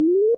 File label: tone up shorter faster